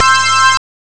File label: synth pro dur, Amiga Soundtracker Sample; Amiga Soundtracker Sample Packs